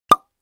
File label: wine cork, samsung galaxy watch